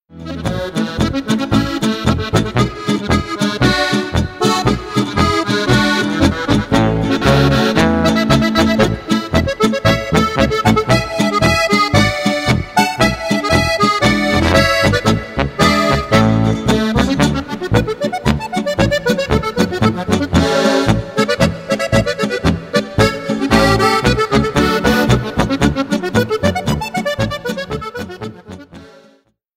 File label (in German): Polka